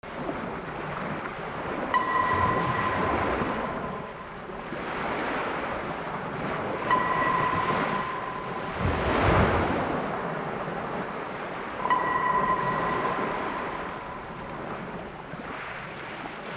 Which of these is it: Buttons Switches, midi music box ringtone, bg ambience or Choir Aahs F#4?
bg ambience